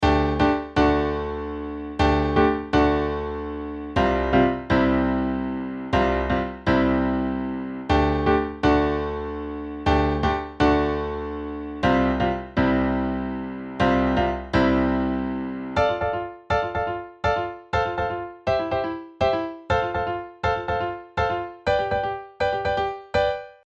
Accomp

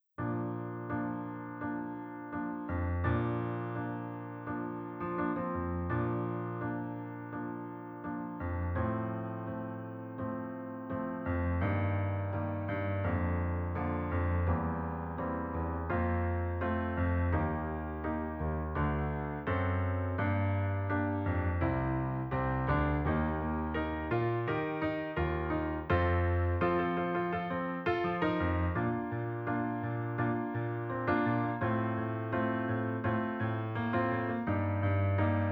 piano

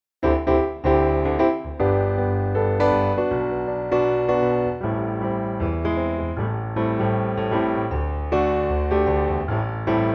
piano

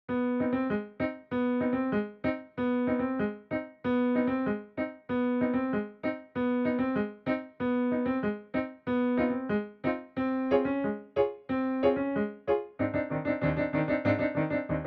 Accomp

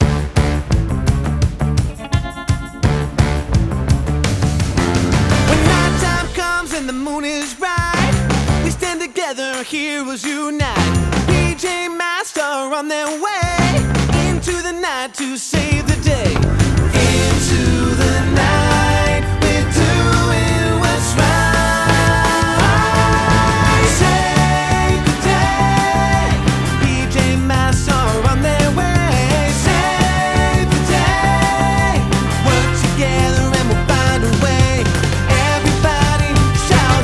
Song with vocals

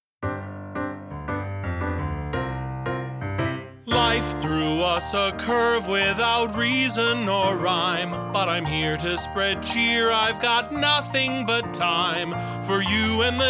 CHRISTMAS guide vocal

